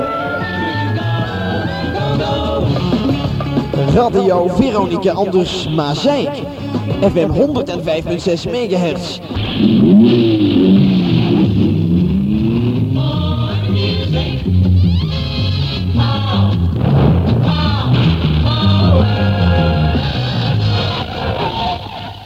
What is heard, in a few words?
Jingle more music power; Station Calls